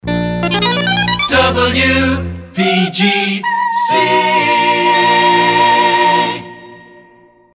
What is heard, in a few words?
jingles resings; pams series 42a